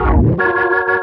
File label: ORGN 123, Keys; Houseworx